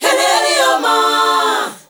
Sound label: ZULU CHR, FEM. CHOIR